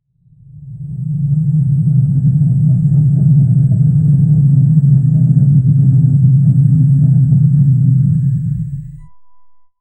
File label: grainy rumbling sound, take off